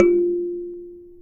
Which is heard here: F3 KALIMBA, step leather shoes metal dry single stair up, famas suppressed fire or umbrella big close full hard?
F3 KALIMBA